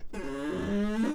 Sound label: primer out, c172p